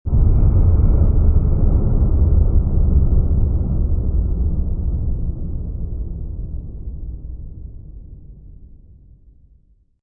Explosion Space Distant, A deep explosion, reverberant and long lasting. Distant space exp; Distant space explosion, underground explo or underwater boom; Lynne Music